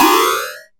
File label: haste speed; Status Effects; freepixel